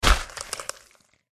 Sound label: dog attack contact